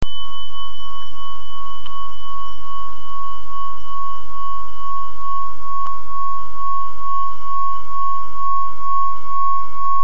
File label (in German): gerieben